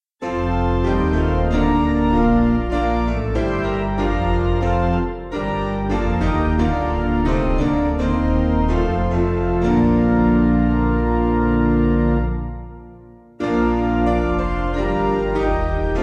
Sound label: Piano